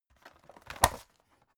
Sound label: folder open hard